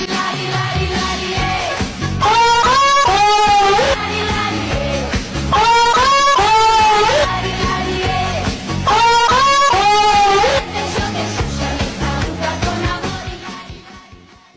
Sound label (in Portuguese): gemido